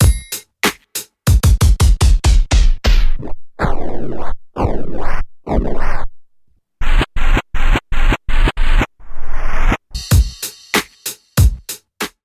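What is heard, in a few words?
lp Breaker Loop; KP3+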